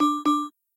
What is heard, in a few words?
Approach, iOS Sounds